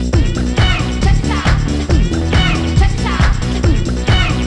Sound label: Disco; Monsters Inc. Scream Arena; GC